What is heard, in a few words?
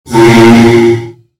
reverb, farts